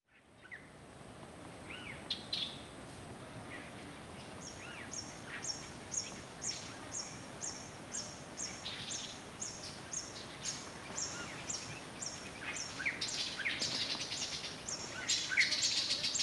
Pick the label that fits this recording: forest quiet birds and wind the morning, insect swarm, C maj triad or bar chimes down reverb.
forest quiet birds and wind the morning